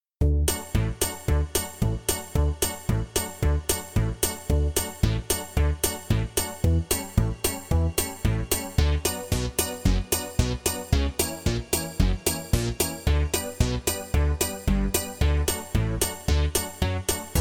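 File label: backing track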